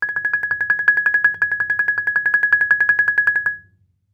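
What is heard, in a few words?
Marimba roll fortissimo Ab2; MIS Pitches - 2014; Percussion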